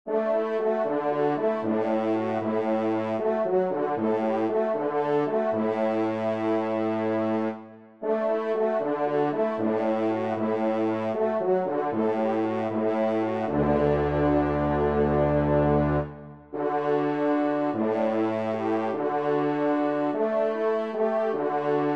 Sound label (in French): trompe; Clair de Lune